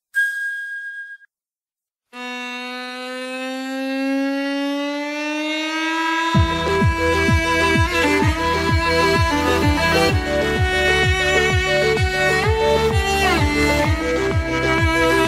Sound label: floor music; jessica lopez